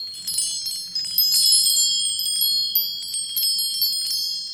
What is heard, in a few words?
IND. BELL, Indian bell